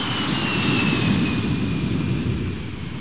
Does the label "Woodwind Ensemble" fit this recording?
No